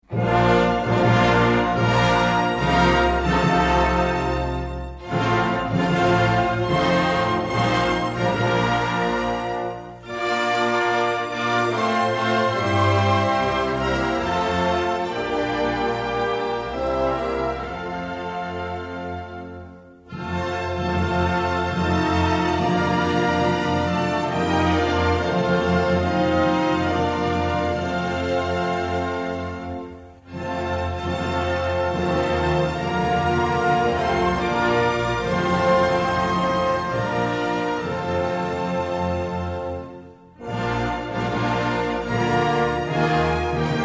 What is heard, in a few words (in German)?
Hymne interpretiert von Orchestre